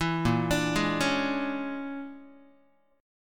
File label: A sharp 7th Sharp 9th Flat 5th, Guitar; arpeggiated; 7,6,5,6,5,x; chord; JGuitar